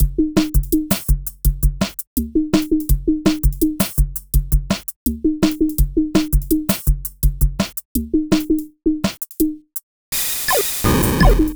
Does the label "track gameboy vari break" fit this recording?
Yes